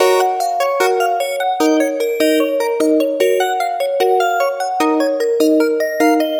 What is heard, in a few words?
Melody D# 150 Toy, Melodic Loops, Color Bass; Ghosthack - Color Bass Essentials